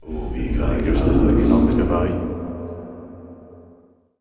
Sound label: Acolyte Mining; Warcraft 3